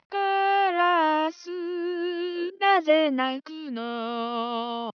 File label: synthesized singing female